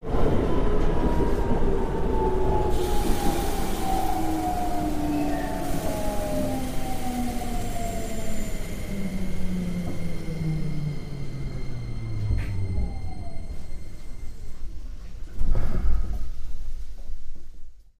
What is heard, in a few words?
train stop, machine